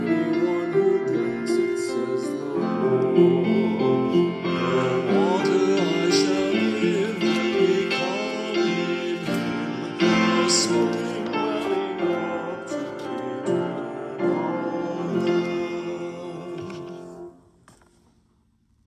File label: Communion Antiphon